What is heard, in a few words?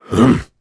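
Vox Landing Japanese; Kaulah; kings raid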